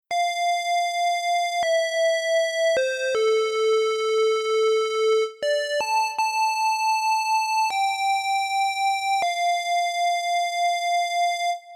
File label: only melody